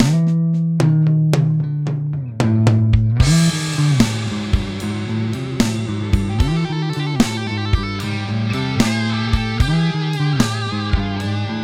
E-Gitarre Lead; Gus G Jackson Signature

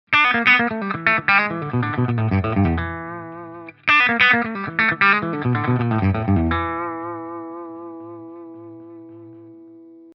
Z.Vex Sound of 59 Vertical Clean Boost neutral kleiner Sparkle

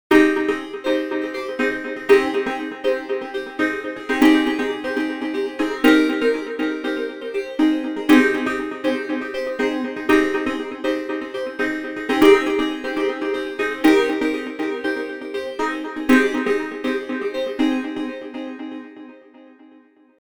Akkord

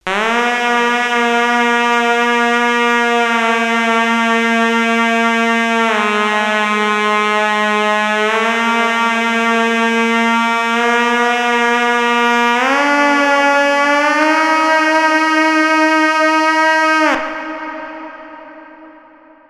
Otamatone Deluxe simple Melodie mit Reverb

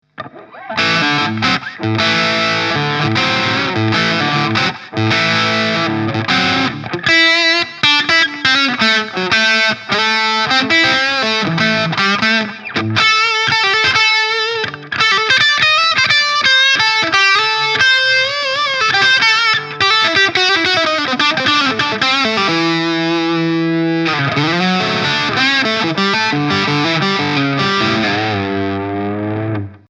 Stegtonabnehmer verzerrt

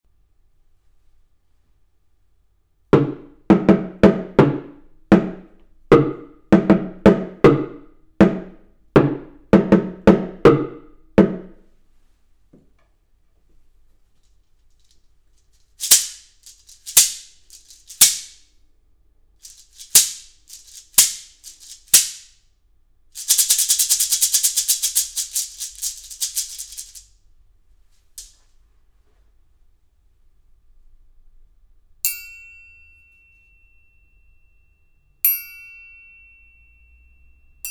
Lewitt LCT640 TS Perc Kugel

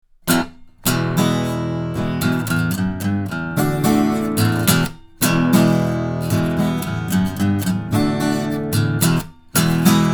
C 80 Gitarre

